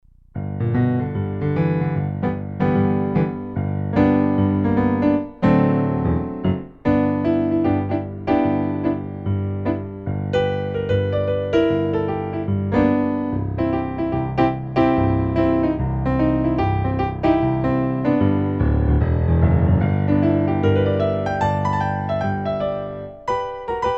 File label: this little light, solo, intermediate piano solo; this little light of mine